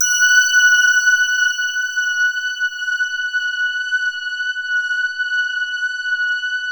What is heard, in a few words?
CRYSTAL F#5; Music Store - Claudius Diemeter Schwerlos; FINE ART 2